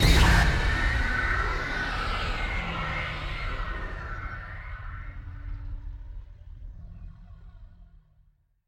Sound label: shock instant; Joe's Diner